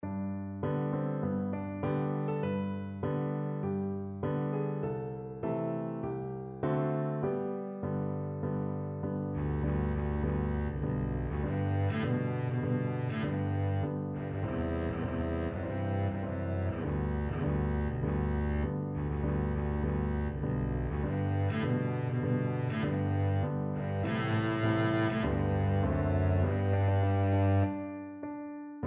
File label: double bass